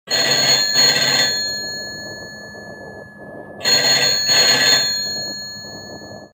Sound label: Old phone